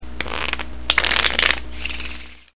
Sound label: Shuffle